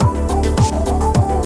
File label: ooh ooh